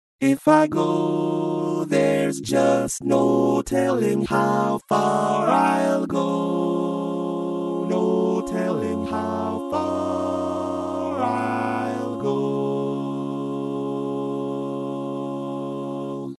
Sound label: All Parts, barbershop tags